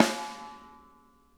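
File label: snare edge; Sonic Reality; Interactive Drum Kits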